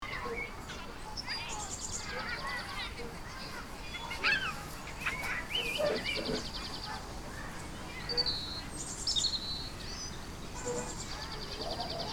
Park ambience birds kids playing and people talking